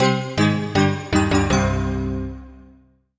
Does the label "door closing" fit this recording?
No